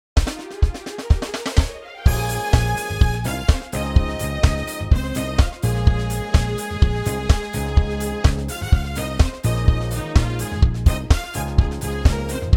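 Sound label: Ab no guitars; key - Ab - vocal range - F to F (optional Ab and Bb falsettos)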